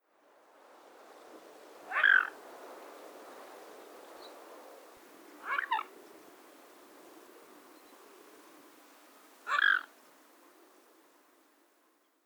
cygne tubercule

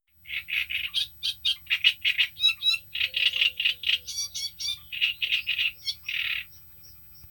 rousserolle turdoïde

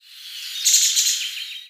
grive musicienne

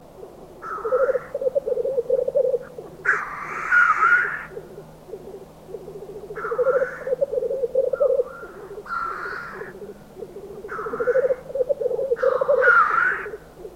tetras lyre

